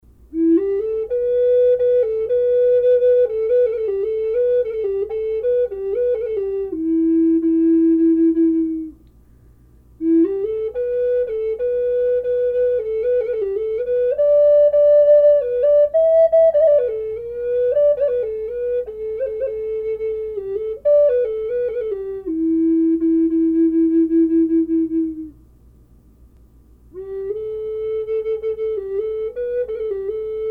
e minor, flutes; golden eagle; collector flutes

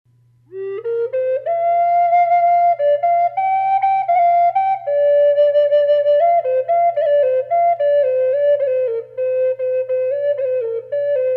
kermode white bear reverb, flutes; collector flutes

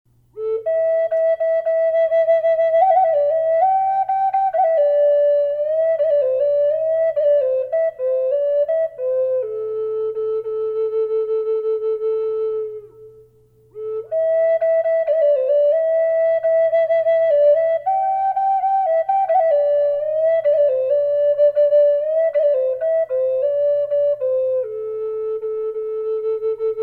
reverb a minor flute; collector flutes

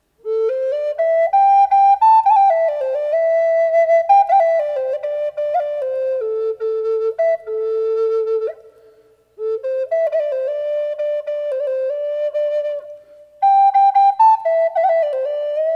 sedona song bird flute reverb

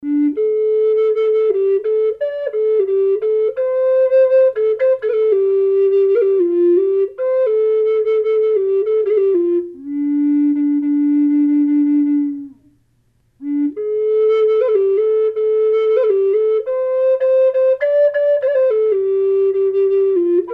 snowy owl yellow cedar low D, flute

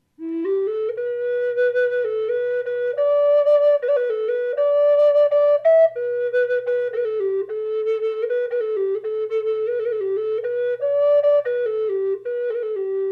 e minor dove sample sound, flutes; collector flutes

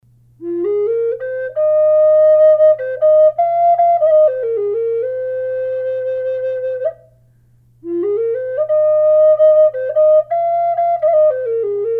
woodpecker f minor, flute; collector flutes

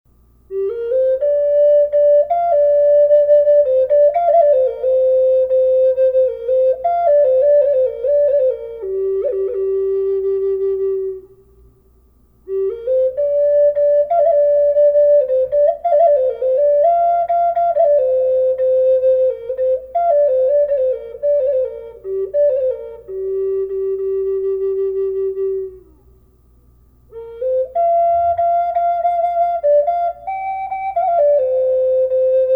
g minor reverb mourning dove flute; collector flutes

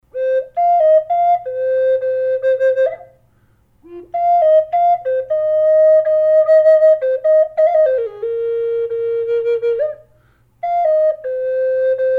bocote raven f minor, flutes; collector flutes

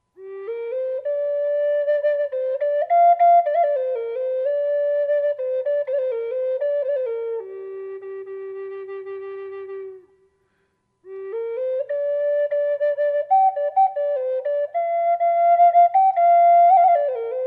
white buffalo calf reverb, flutes

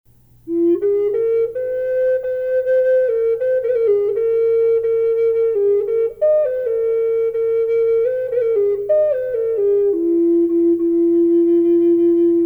reverb koa, flute; humback whale; collector flutes

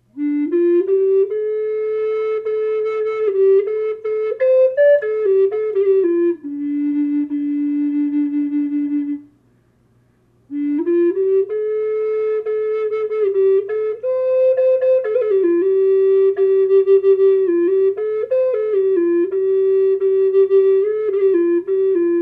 low d dry minor, flute; collector flutes

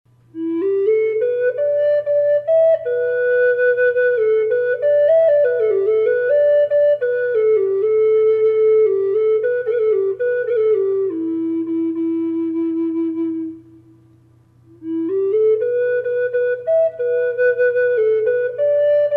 hawk e minor flute reverb; collector flutes

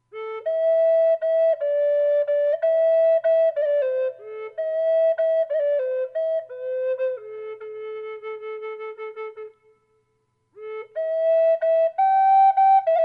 hummingbird burl reverb, flutes

